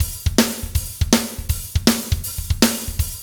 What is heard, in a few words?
Fast Shuff, Drumscapes Roland; Northstar